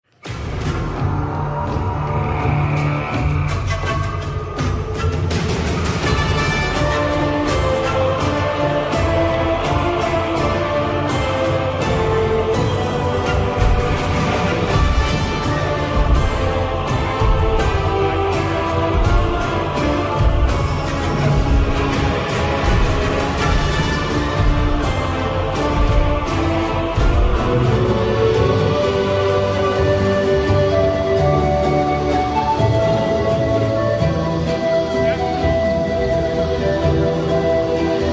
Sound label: Music; Zuldazar; WoW Battle for Azeroth; BlizzCon 2017